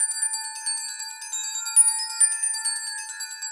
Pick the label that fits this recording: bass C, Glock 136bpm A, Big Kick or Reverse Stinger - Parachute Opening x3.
Glock 136bpm A